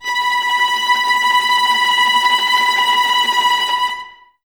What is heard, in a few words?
Violas Tremolo B 4, String Ensembles; Miroslav Vitous